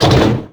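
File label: lever down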